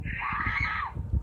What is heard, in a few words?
screm